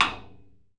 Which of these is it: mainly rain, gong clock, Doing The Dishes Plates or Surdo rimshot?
Surdo rimshot